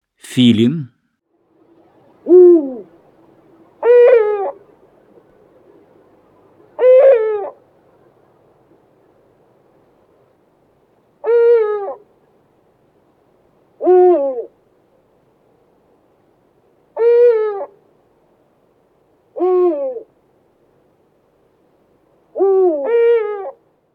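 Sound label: eagle owl; Filin; Golosa ptic i zverei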